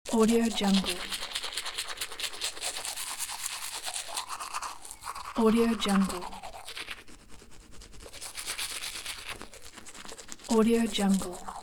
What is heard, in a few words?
brushing teeth loop